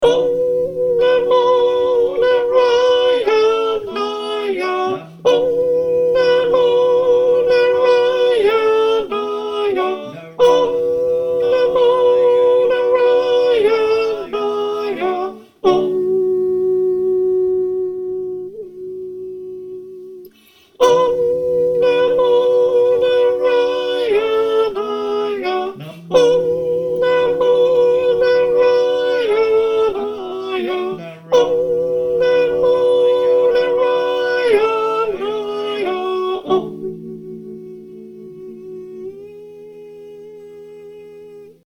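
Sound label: soprano, choir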